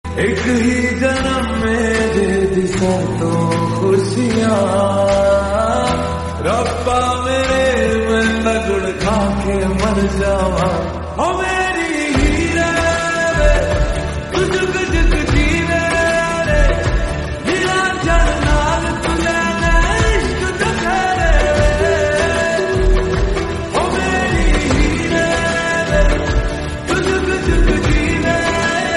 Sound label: punjabi song; shiddat